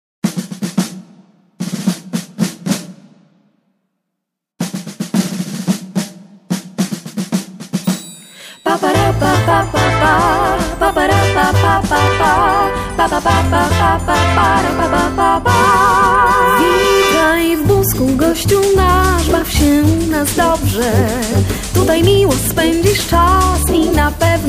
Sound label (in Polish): wokal; Witaj w Busku